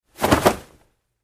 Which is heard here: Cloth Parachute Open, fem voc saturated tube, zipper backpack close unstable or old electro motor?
Cloth Parachute Open